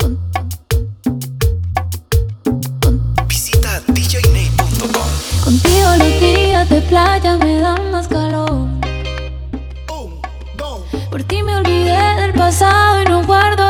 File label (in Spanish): Acapella Hype